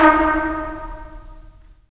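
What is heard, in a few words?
brass trumpets staccato, right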